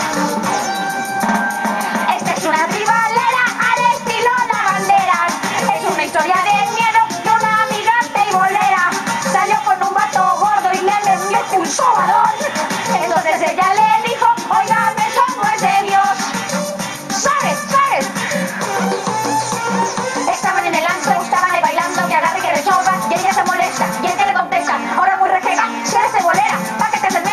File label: Tribal Lavanderas